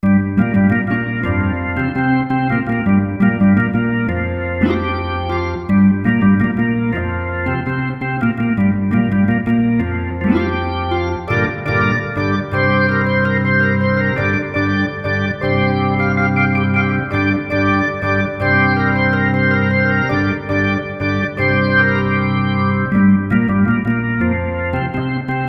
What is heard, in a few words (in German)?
Hippie Beatnix Orgel